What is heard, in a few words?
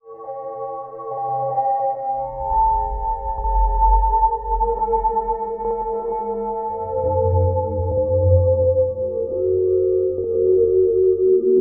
soft bell, bell atmos; Zero G; Malice In Wonderland